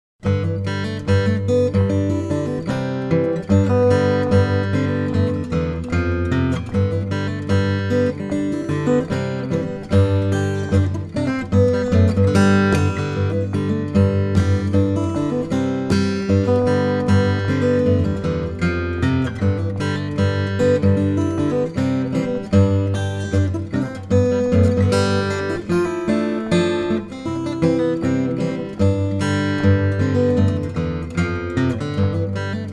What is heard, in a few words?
rhb guitar